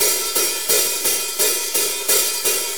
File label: 4-4 HiHat Loop; Drum Tools